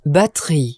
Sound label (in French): batterie